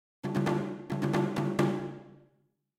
Music Battle

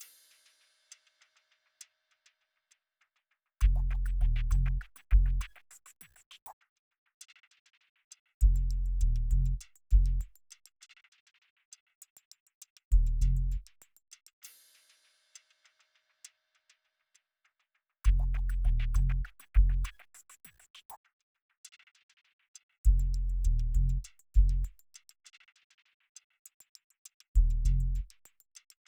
Quest Base Loop

